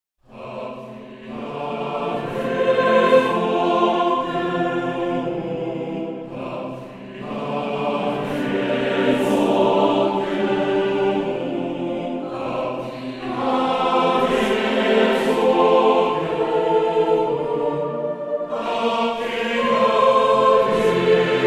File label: choir